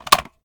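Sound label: sniper reload empty extended mag mag out; gmod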